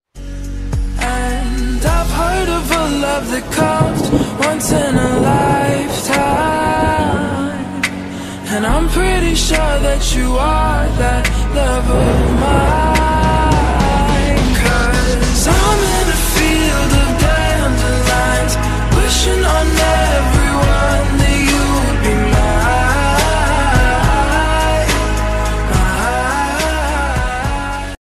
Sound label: whatsapp status music melody